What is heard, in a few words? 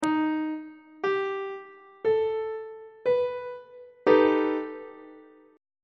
c flat augmented 7th 1st inversion; on treble clef